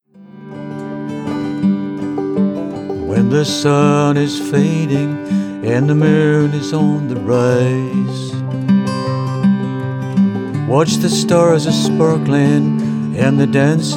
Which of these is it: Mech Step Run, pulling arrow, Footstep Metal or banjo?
banjo